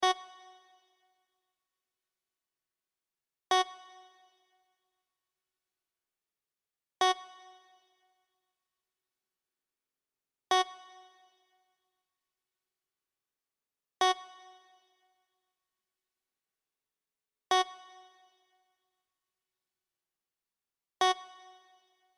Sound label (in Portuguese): amostra de pulsos com periodicidade